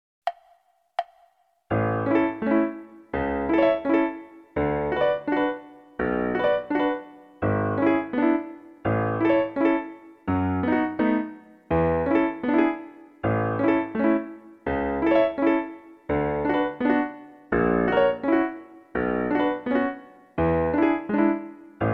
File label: fruhlingslied vlc a-dur; mendelssohn